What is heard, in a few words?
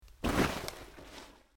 Dropping Backpack, Dropping Backpack Or Bag Onto Floor Close Persp